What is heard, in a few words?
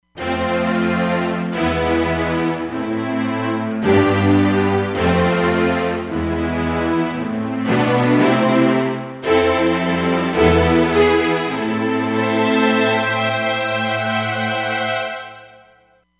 X Str Section, Roland Fantom XR